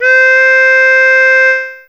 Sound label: Harp C5; Fairlight CMI IIe